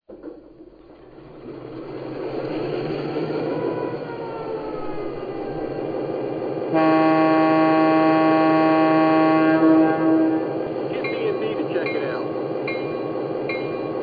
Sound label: E8 ABA